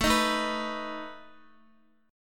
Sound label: A Suspended 2nd Flat 5th A down Guitar; Asus2b5 strummed